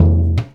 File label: SURDO, Percussion Slam; InVision Interactive